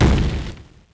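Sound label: explosion; barrage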